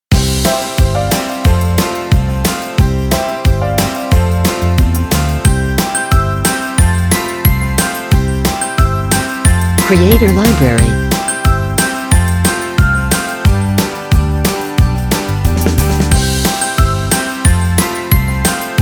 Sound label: Happy Smiling Family, Children